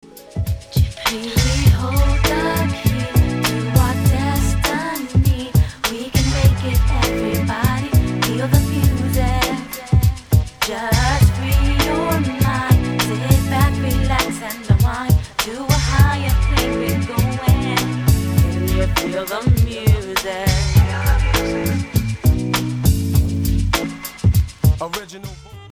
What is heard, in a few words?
smooth jazz chillout; multitrax